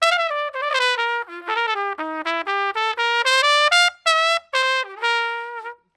120 Trad Jazz Trumpet Solo _Cmaj; Brass; Pro Tools Essential Loops; Digidesign

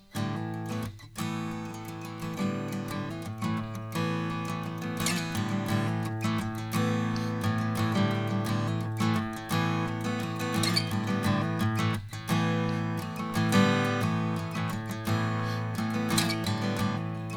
Ac Gtr U87 EQ; Pro Tools Essential Demos; Digidesign

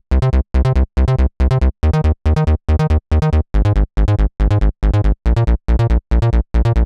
140 House Bass Cmin; Digidesign; Pro Tools; Pro Tools Essential Loops; Dance DJ

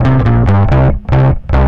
142 Garage Fuzz-Bass Cmin; Dance DJ; Digidesign; Pro Tools; Pro Tools Essential Loops

